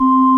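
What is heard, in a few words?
ORG Vox, Continental organ; Keyboards of The 60's and 70's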